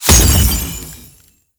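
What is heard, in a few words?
Boss Projectile Impact; Warframe; Cephalon Weave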